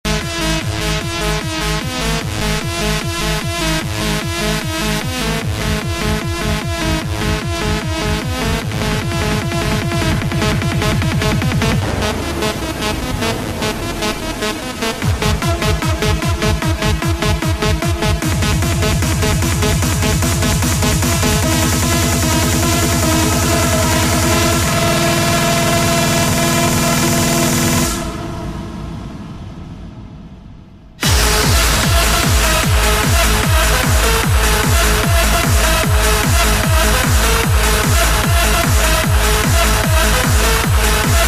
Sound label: Impact